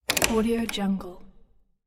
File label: doors hardware turn latch heavy; blazznet